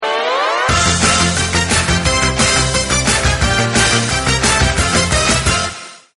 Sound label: cancan sound; jackpot 6000